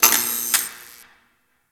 Derelict Light Flicker; Ambience; Props; Warframe; Orokin Derelict

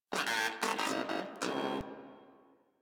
Moa Aggressive Vocal Battle Ends; Warframe

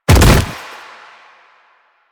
Bodyfall Light Default; Warframe; Lasrian; Lasrian Soldiers; Foley; Body Falls

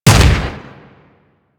Fire Shot, Weapons; Warframe; Boar

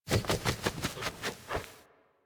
Foley Idle Ear Scratch Fast; Enemies; Kubrow; Warframe

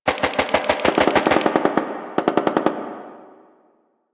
Distant Gunfire; Warframe; Ambience; Nineteen Ninety Nine

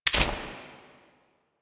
Customization UI Sel Item; Veilbreaker; Kahl

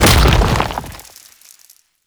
Arm Proj By Explosion; Warframe; Man In The Wall

